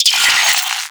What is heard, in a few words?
Gas City Private Trans In; Alad V; Warframe; Corpus